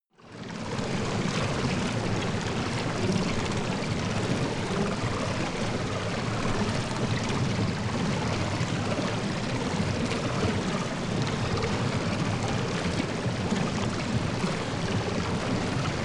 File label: water fx